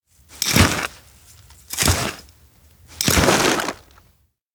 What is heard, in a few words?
shovel dig timecapsule; anemoi